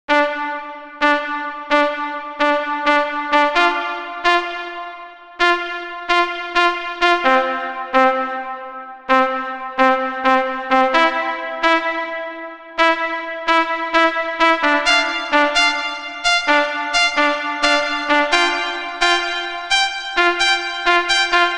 Drum

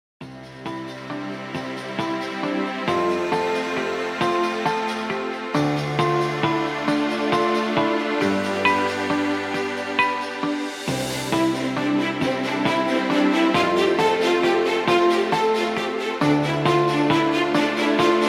Ambient Movement Relax Music

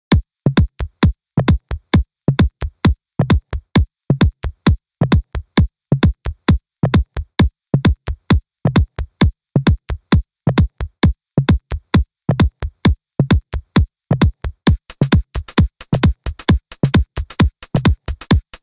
Progressive Trance

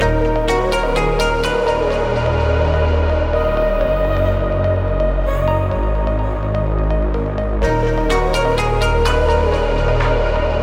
Atmosphere

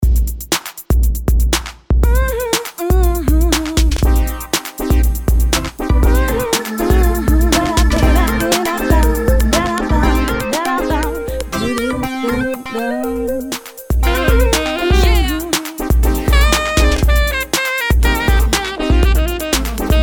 Jingle; On Tv! - Vol.18

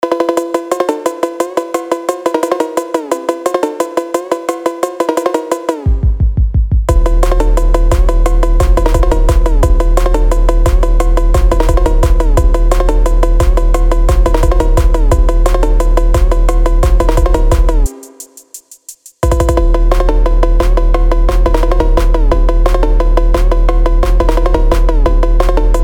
Phonk-Фонк

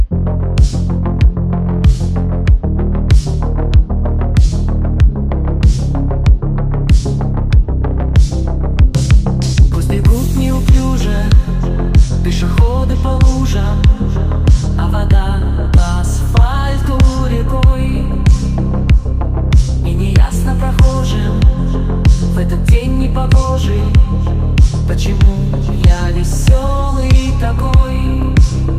Synthwave

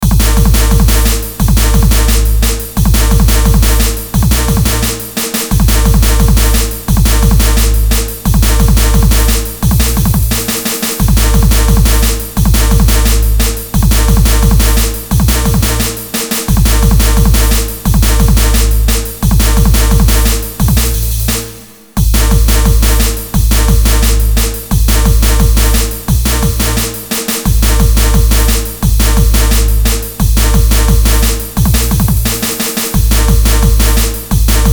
Artificial Drums

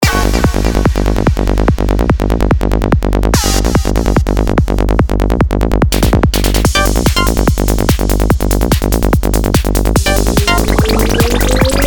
Psy Trance; Sytrus Psy Trance Preset bank + FLP

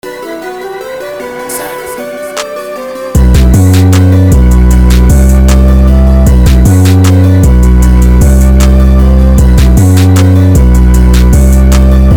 154bpm

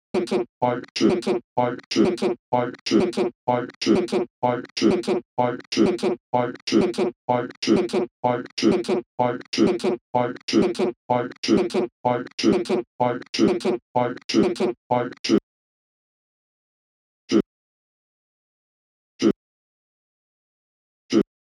Acapella

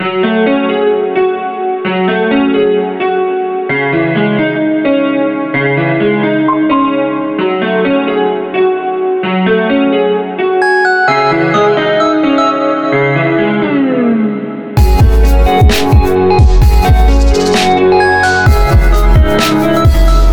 Piano

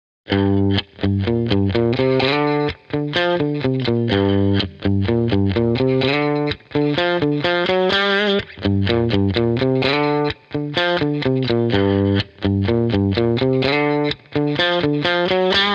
Guitar riff Gm Crunch bpm126

